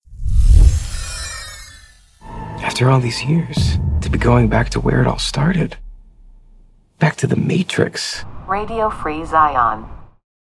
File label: Jingle